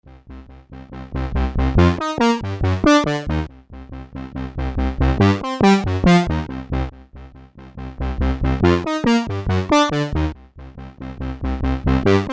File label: Analogue Solutions Megacity Midi Velocity